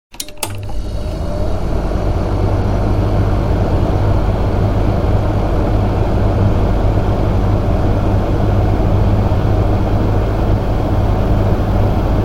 Dehumidifier, Dehumidifier appliance being switched on and left to run and then turning off; Appliances; Household